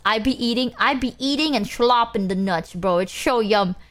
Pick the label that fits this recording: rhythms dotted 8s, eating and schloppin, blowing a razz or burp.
eating and schloppin